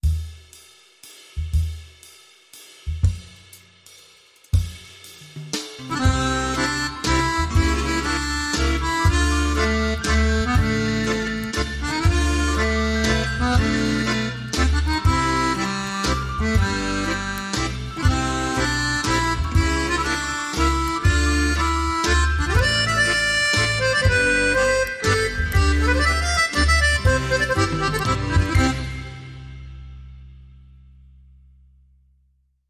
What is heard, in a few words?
Storytellers Waltz